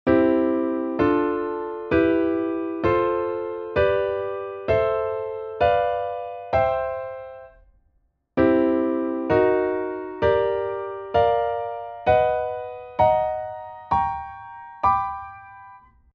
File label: music